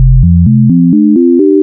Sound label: Harmonics Biharmonic Steps; Ableton Live 12 Lite; Vector; Sprites